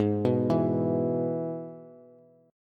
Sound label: Ab Minor Sharp 5th Ab down Bass; Abm#5 arpeggiated; JGuitar